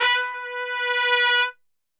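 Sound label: B 2 trumpet, Brass Super Section; Best Service; TRP SWL; 90 s Sample CDs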